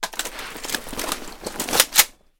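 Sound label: preparing gear before attack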